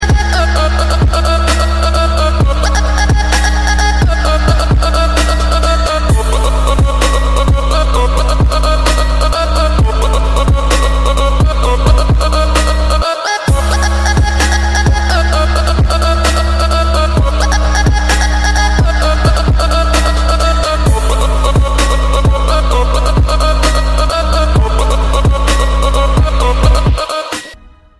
instrumental ringtone

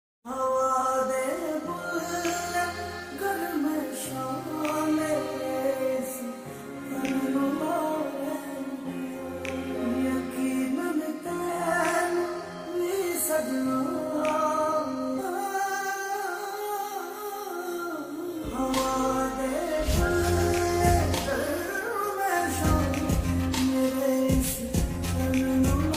ringtone, punjabi

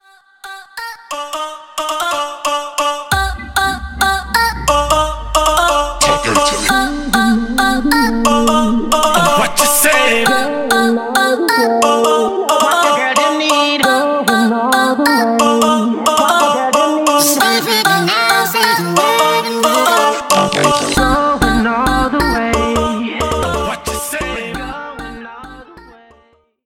samsung instromental ringtones

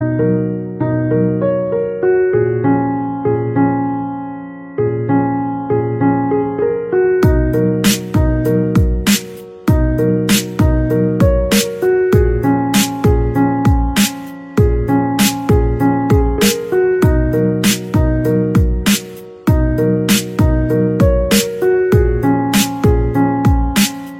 instrumental

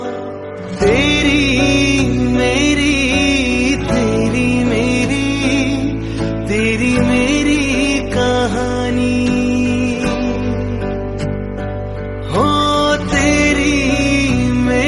male version

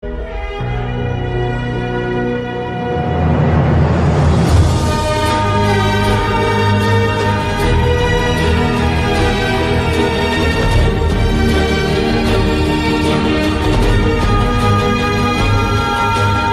instrumental ringtone

